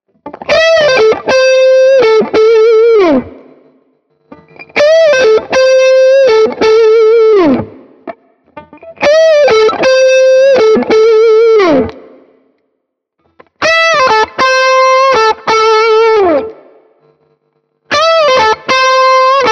Ibanez AR520HFM mid gain all positions Licks

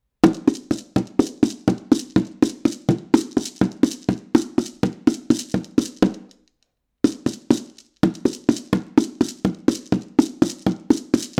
AEA R44C Mic B Percussion

